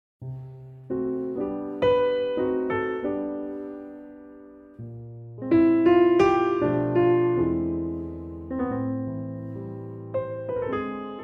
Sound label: C piano